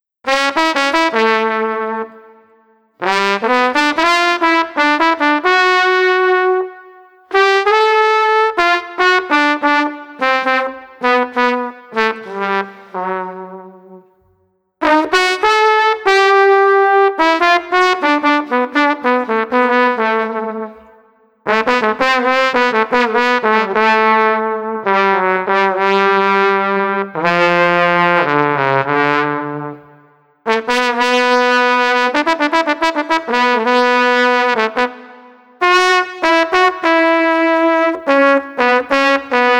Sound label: Street Trumpet Melancholy 98